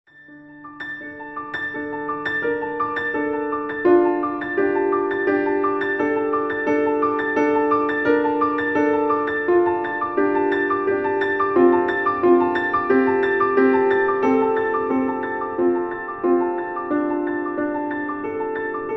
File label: Piano